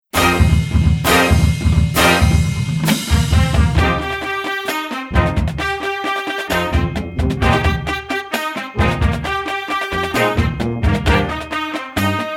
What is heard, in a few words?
Marching Band; Publisher: Hal Leonard